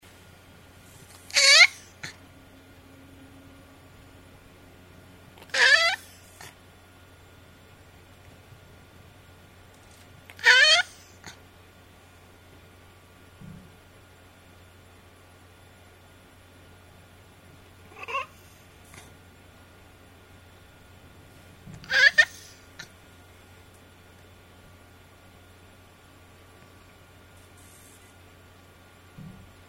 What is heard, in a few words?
a cat - definitely; fieldrecs